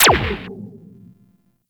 Laser, Weapons; Crewman Laser

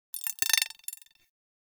Rifle Firing Stopped Mech; Weapons; Warframe; Corpus; Ruban

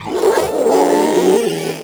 Strike Scream; Enemies; Abilities; Warframe; Proto Infested Ancient

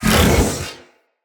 Iron Dodge Burst; Warframes

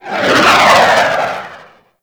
Knight Plunge Ability Angry Proj By; Warframe; Duviri; Dax Knight; Abilities

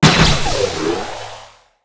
Shield Down; Tenno Shield

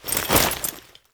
foley weapon swap; Warframe; Characters; Kahl

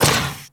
Moa Combined Step Default; Warframe; Footsteps

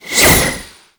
Quick Strike Projectile Pass By; Enemies; Abilities; Warframe; Tau; Roathe

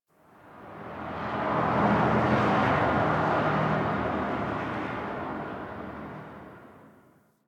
Distant Traffic N; Warframe; Ambience; NineteenNinetyNine

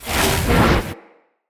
Ground Slam Charge Weapon; Enemy; Abilities; Nimrod

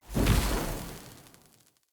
Casting Device Blast, Weapons Tech; Enemy

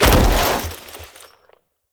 Foley Roll; Warframe; Characters; Kahl

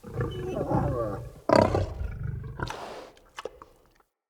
Wolf Yawn; Animals; Vocals

